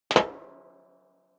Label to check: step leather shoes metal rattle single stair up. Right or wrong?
Right